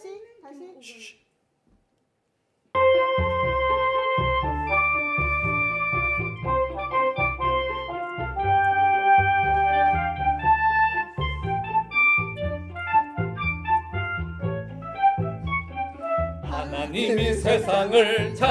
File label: Korean Changjo Creation Song